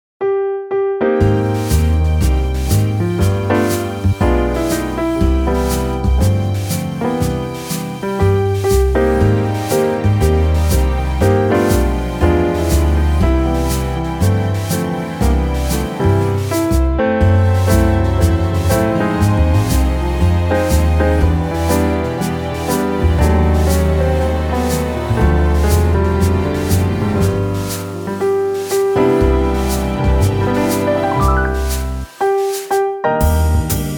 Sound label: Jazz